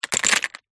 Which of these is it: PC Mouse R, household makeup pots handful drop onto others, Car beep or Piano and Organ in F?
household makeup pots handful drop onto others